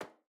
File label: metal solid step; CrossCode